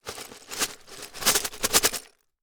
Foley Plastic Pieces Plastic Cup Rattles

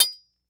Sword Hit Cinder Block, weapons; AdobeCC

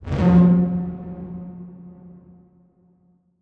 Transition Metallic Blast; Production Element

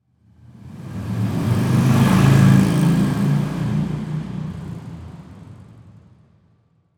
Auto Motorcycle Drive By; Transportation

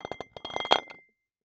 Weight Sliding On Metal Weight Lifting Bar; Sports

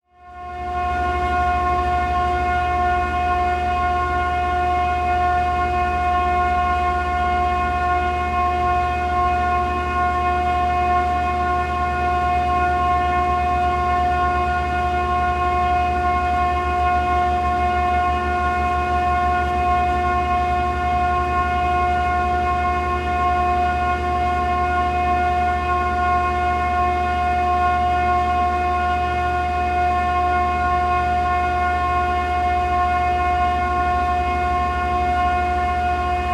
Industry Industrial Air Conditioner

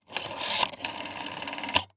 Household Phone Dial Rotary Eight

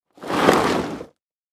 Impact Plastic Drag On Dirt Quick

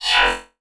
Multimedia Internet CD-Rom Flash Hi-Tech

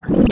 Multimedia Internet CD-Rom Flash Pop Up

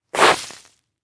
Foley Footstep Boot Skid On Leaves; Foley Footsteps

